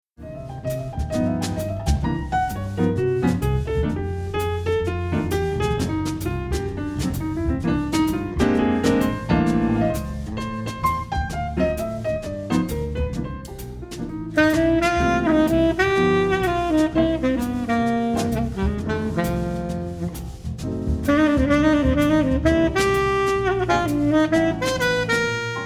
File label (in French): extrait blues